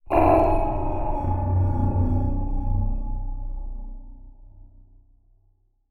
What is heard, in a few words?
Transition Communication Distortion Scanner; Adobe; Production Element